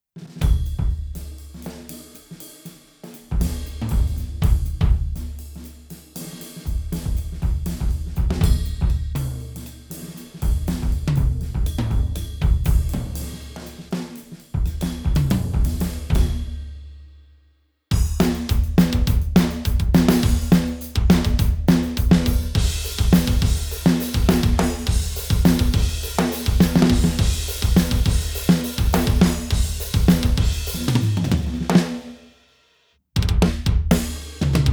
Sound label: iron snare